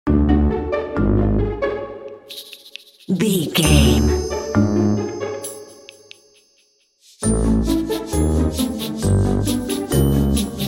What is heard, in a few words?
stealthy tiptoeing